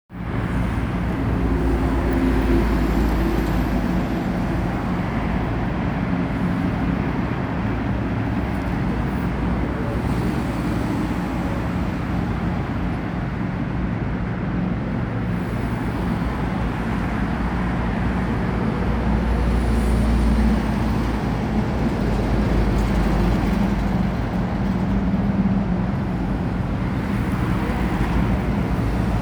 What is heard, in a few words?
autoroute; trabzon